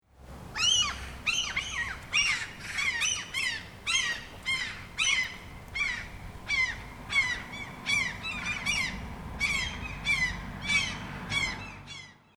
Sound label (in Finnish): kalalokki; linnut